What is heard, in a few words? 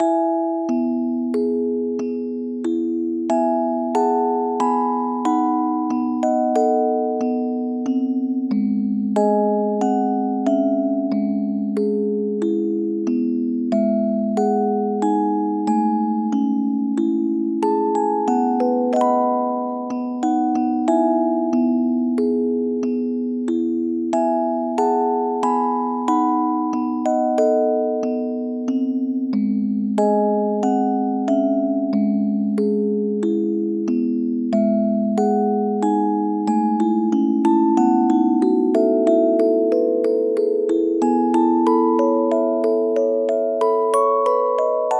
musicbox tender